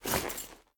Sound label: pet armor; smite; lancelot